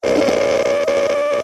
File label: leisure whoopee cushion squeeze fart strained whine med long